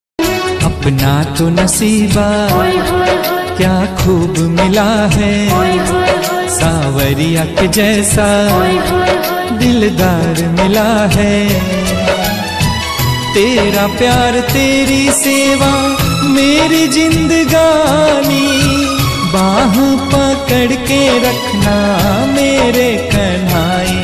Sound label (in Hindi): khatu Shyam ringtone bhakti ringtone Shyam Baba Bhajan